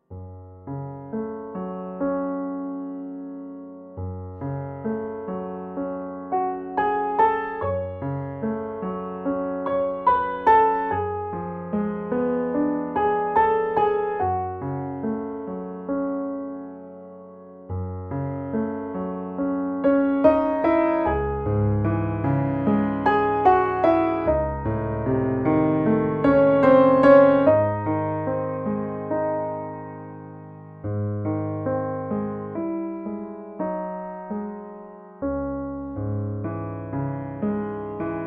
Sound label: Piano Version; Steven Universe